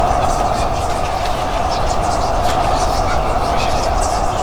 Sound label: Loop Ghost; KriptoFX; Realistic Effects Pack v1